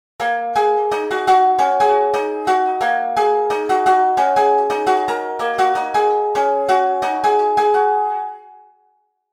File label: Zheng; SS Masterclass; Damper